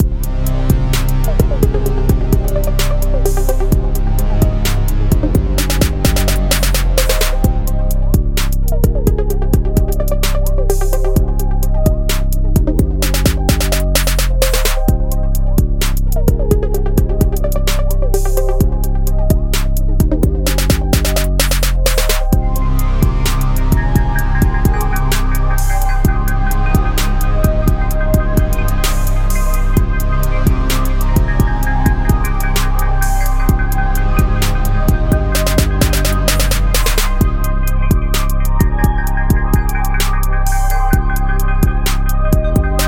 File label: helium trap loop